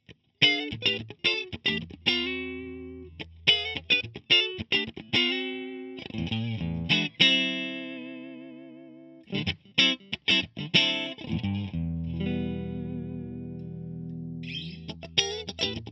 strat clean

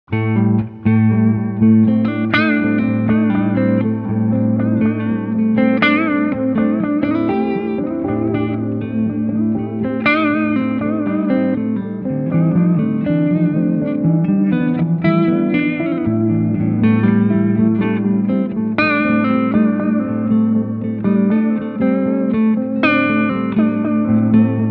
Tape Echo

